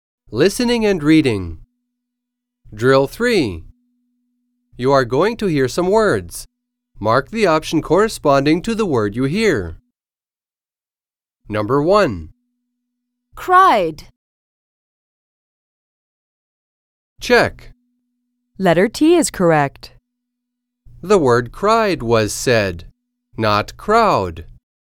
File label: drill